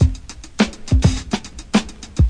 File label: 104 walk in the park, DRUM LOOPS and BREAKS; 101 to 110 bpm